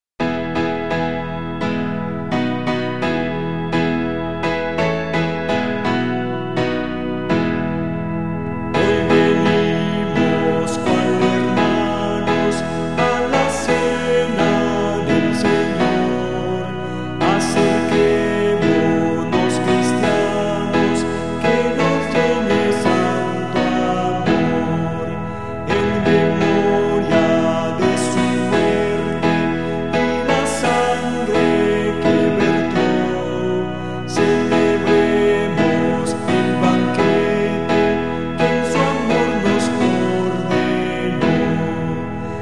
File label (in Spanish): Hoy venimos cual hermanos melodia palabras